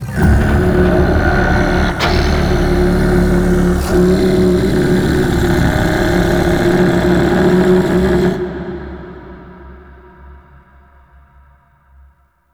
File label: Sand Vocals; Warframe; Man In The Wall